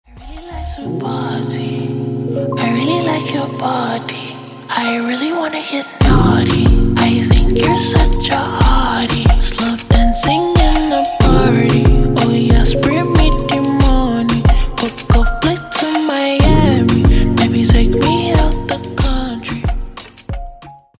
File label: slowed reverb